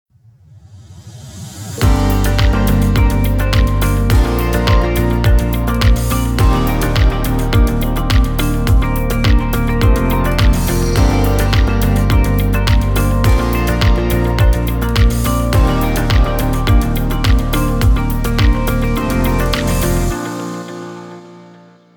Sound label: Calm Corporate Confidence